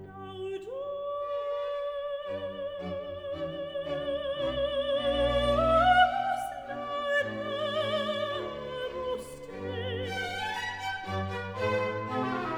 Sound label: Missa Cellensis Laudamus te; JHaydn